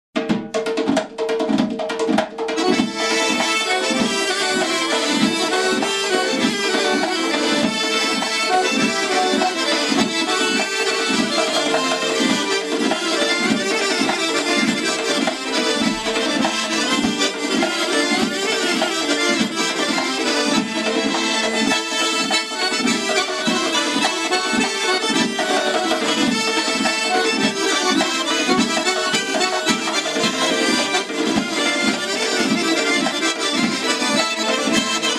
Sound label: georgian folk dances mtiuluri